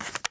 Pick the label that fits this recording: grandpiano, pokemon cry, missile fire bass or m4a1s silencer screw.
m4a1s silencer screw